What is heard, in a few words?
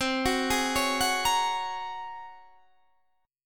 G sharp 11th C